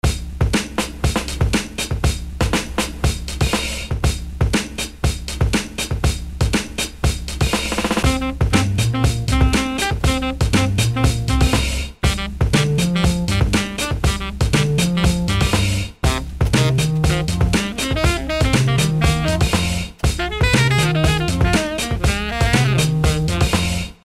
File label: jazzy